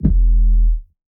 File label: 808s